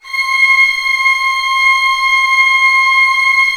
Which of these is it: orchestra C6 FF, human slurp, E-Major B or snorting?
orchestra C6 FF